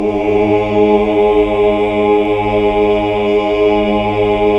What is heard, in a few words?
HAL OOH OOOH Oct